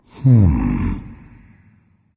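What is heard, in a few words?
thinking, nonverbal; smite; voicepack; khepri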